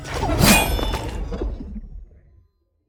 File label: Monster; Zenless Zone Zero